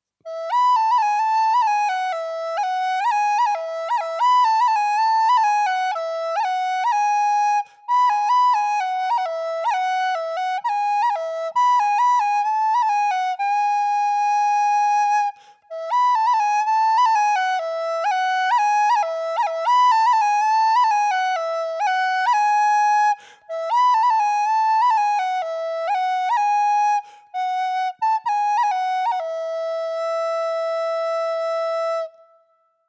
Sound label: whistle E